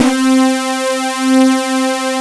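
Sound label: analog brass C4, analog synth; AKAI S6000 CD-ROM - Volume 1; SAMPLE WAVE MIXING 1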